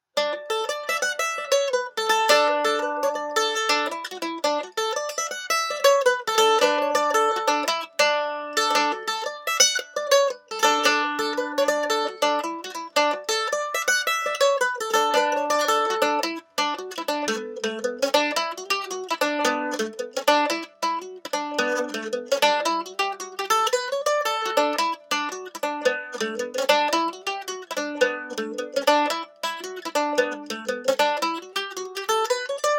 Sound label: Mandolin Pick Comparison